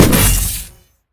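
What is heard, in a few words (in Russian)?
пролетающий мимо свистящий лазерный луч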